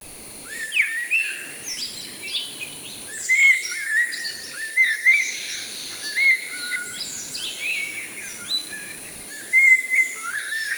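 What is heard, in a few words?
rain forest tropical birds, rain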